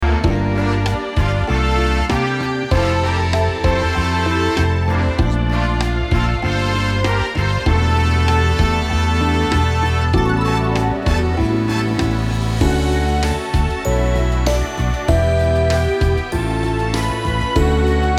vers rumba

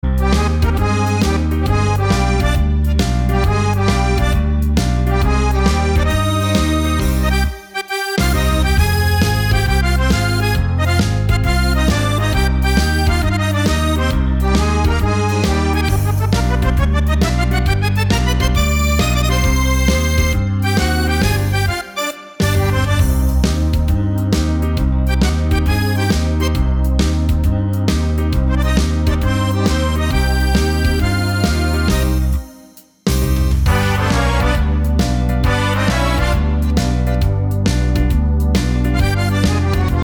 ballabile

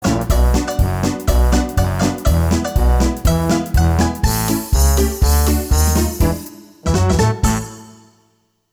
charleston con melodia

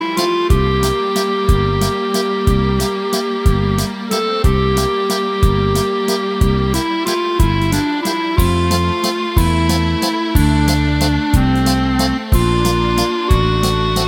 non c e pace tra gli ulivi senza melodia

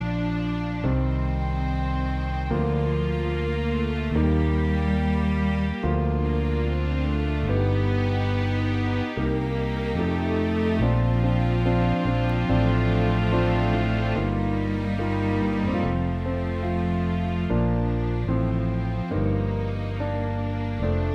per sax

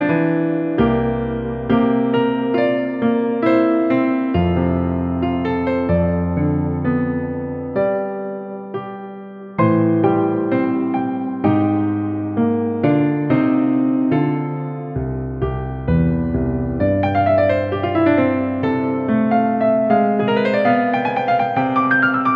solo piano; artisti live

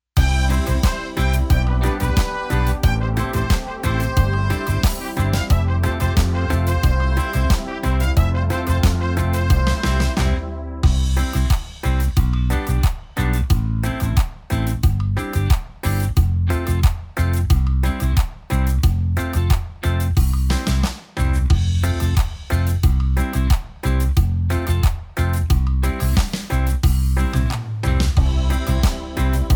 vers cumbia

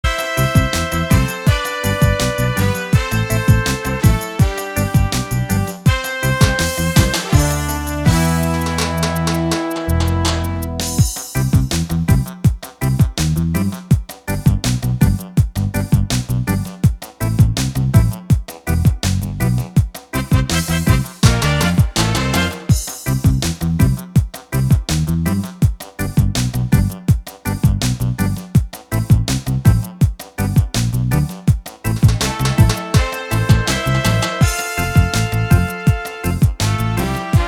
versione reggae

